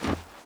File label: snow; firefight